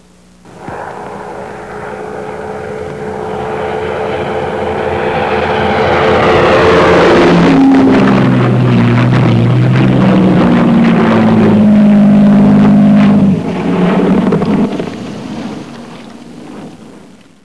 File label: aircraft, spit; warbirds